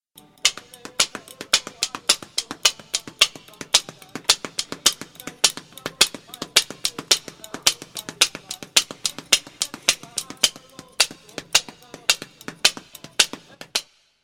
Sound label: Instrument